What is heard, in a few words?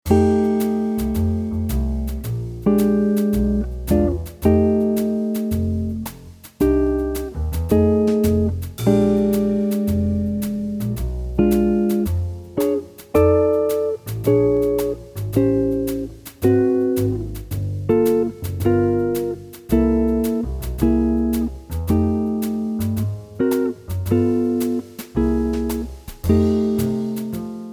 jazz blues, chitarra jazz; studi e modelli armonici per chitarra jazz